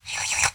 Fast Lick

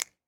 Snap

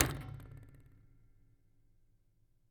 Flick

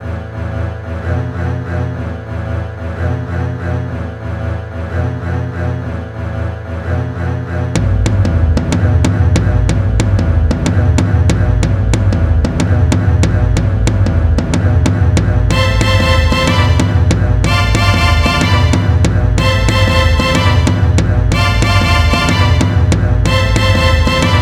Action Bgm

